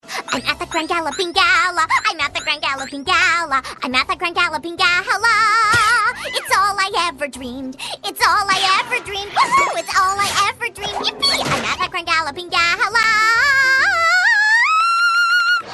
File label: Acapella